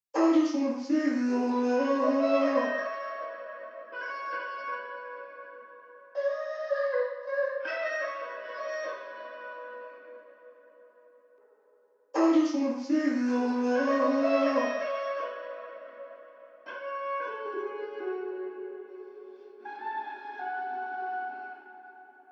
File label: 160 BPM C# Min Vocals; RnB; Loop Stems; Cymatics; 2022 Melody Collection; Feel Ur Love